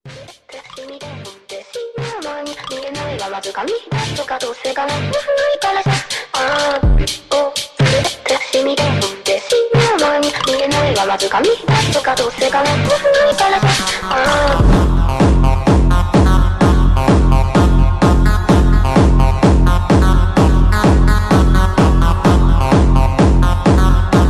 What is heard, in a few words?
phonk Sped up